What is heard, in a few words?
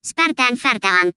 spartanfart